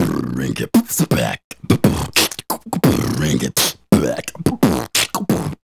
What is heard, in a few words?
Beat Box, Hip-Hop and RnB Vocals